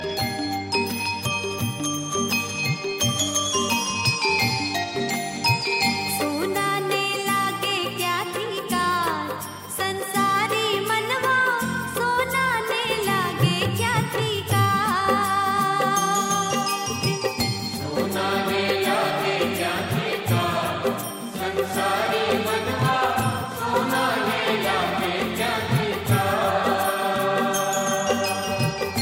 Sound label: Bhajan